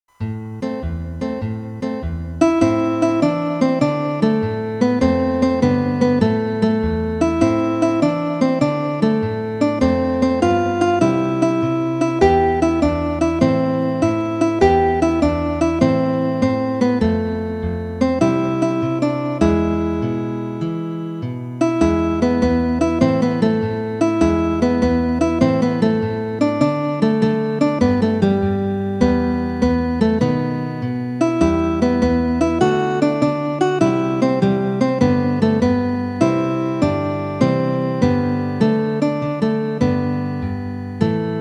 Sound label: synth